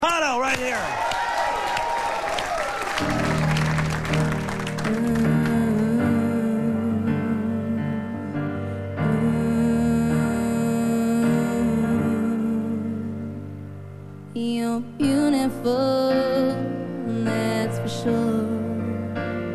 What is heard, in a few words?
acapella